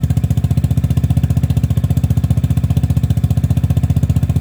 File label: gb350 muffler